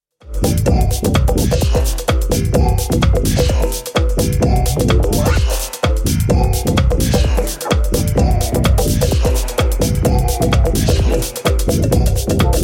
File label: drum talk